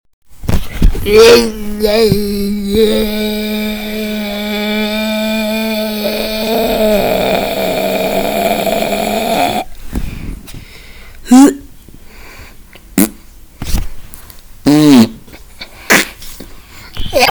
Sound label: fart